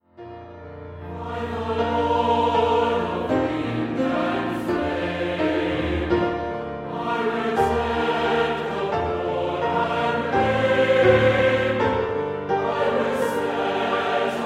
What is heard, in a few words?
Choir